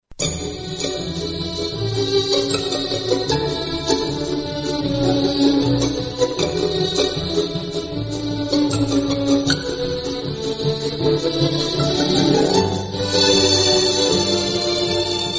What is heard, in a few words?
Technical Difficulties Jingle; Phone Losers of America Media Pack 2017; Music Beds